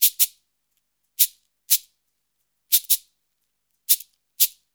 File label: 089 FREES, Modern Percussion Loops